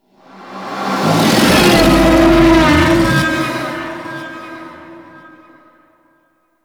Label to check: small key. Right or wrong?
Wrong